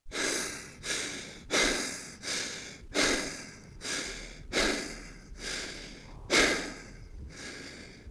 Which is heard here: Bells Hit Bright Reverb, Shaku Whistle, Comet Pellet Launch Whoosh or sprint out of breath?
sprint out of breath